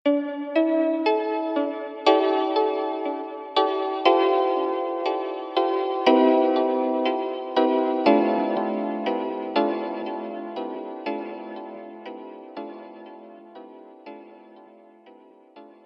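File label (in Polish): magiczna muzyka, 432 hz, magiczną muzyką instrumentaln; magic rainbow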